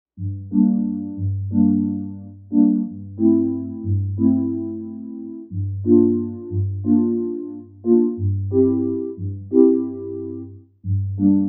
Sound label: Sine Glass